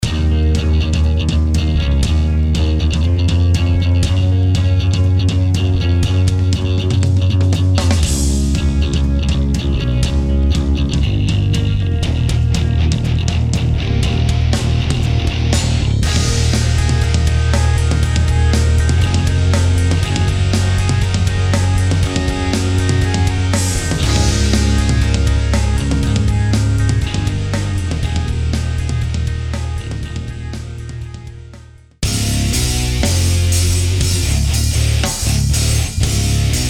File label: mega metal